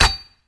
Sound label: armor impact